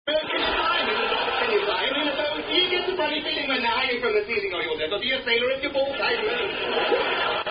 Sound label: Do Your Balls Hang Low Song [Tune The Sailors Hornpipe]; Bottom